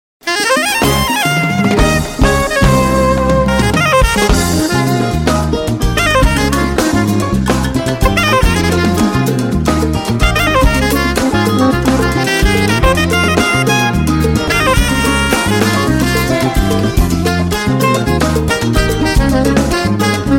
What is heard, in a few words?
instrumental ringtone